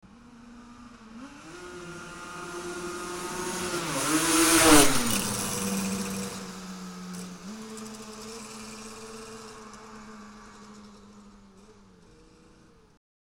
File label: bike